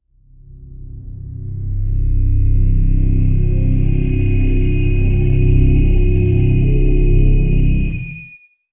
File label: dark cinematic soundtrack, undertones